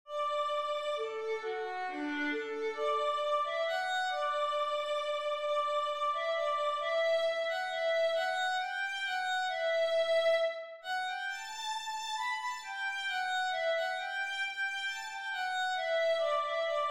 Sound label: violin melody